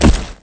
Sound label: body fall dirt; Spearhead Demo; MacFormat 2003 June